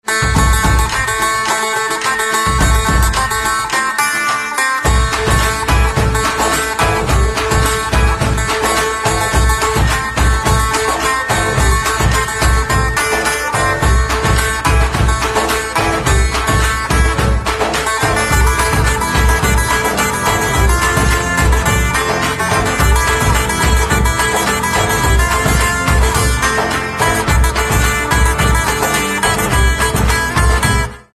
Theme Sitar; Singham

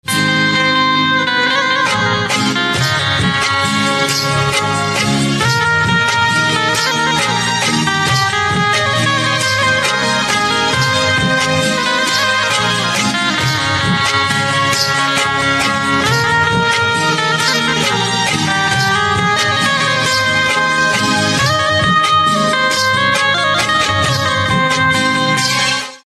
Banjo Ringtone

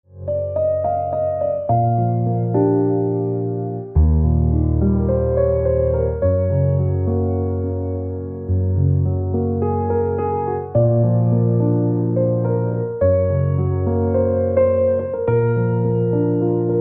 Piano Instrumental; alarm tone

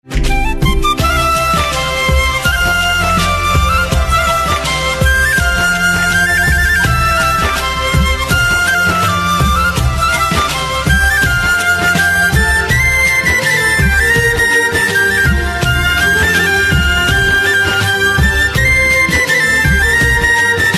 BGM Flute

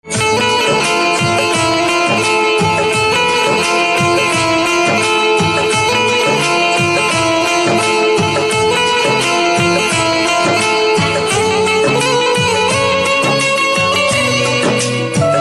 Banjo Instrumental Ringtone

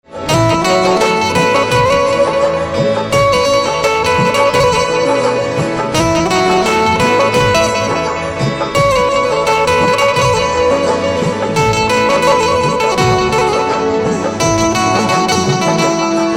Banjo Instrumental Ringtone

